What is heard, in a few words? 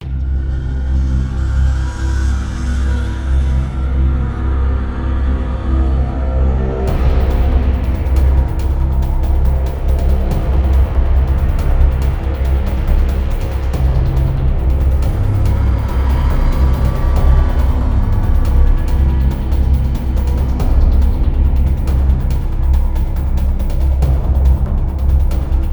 tense, music; Quake 4 Alpha